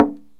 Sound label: PERC KALIMBA